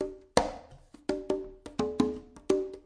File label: basic conga, 084 bpm, drum loop